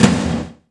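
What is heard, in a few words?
POWER KITS, tom; 90 sSampleCDs; Crypton - DrumBase5000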